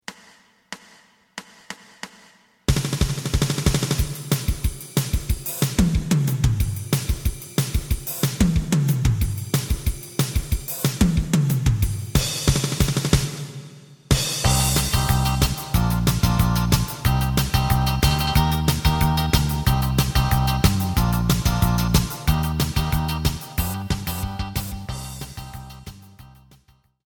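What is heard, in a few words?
lead guitar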